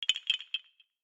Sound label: korok move